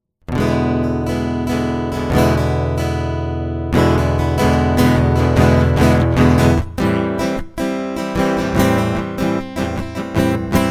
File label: prelude